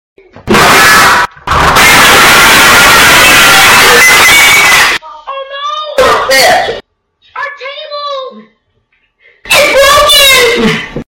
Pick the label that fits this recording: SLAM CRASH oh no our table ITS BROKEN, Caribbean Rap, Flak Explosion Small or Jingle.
SLAM CRASH oh no our table ITS BROKEN